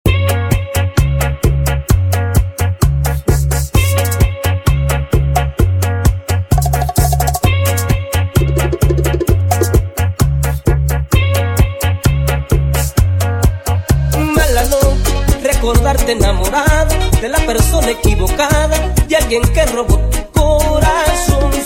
BACHATA INTRO BPM 130

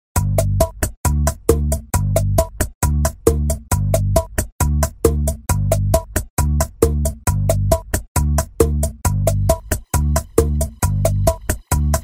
BACHATA INTRO BPM 135 EN VIVO

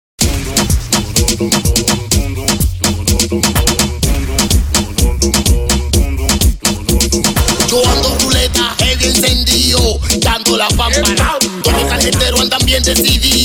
DEMBOW INTRO BPM 126

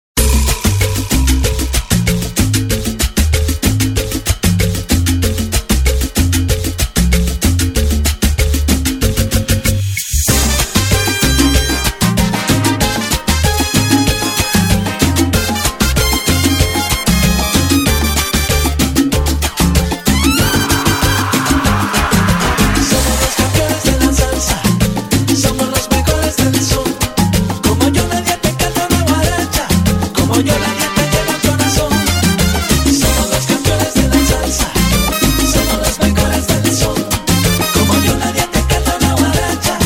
SALSA SALSA INTRO BPM 100

